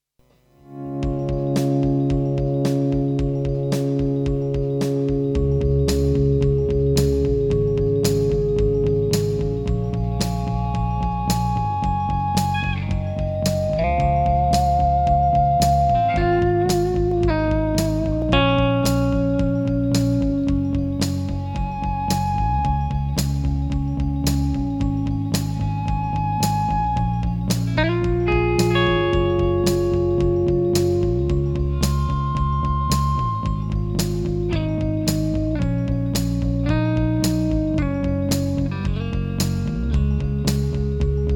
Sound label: Fernandes Monterrey Elite (clean)